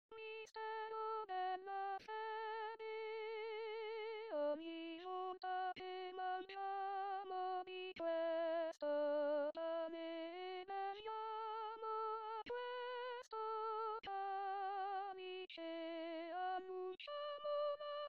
Bassi; anno liturgico; Mistero Della Fede

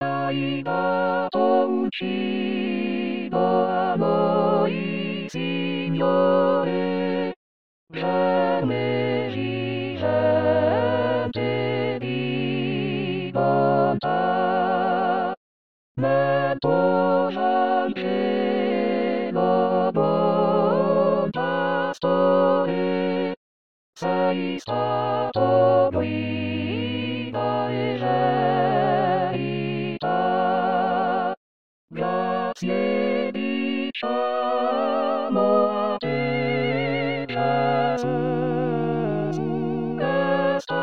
Hai Dato Un Cibo Coro

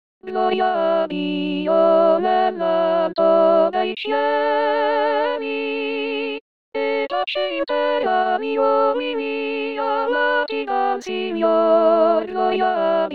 Gloria a Dio Coro; anno liturgico

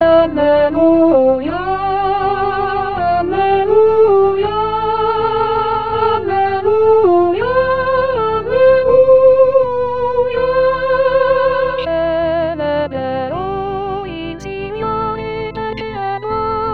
Cantico Dell Agnello Coro; anno liturgico

